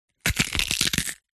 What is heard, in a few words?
horror bone break crush